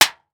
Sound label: Weapon Axe Hit Metal; AdobeCC